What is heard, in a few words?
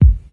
raw kick one shot g sharp key, bass drum tuned to the G# note. Loudest frequency: 92Hz